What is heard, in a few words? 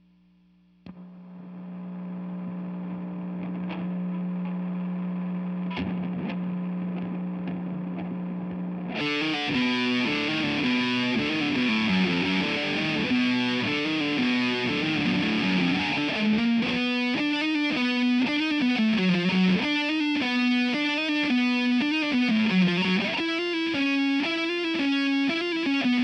GTR; GTR RE20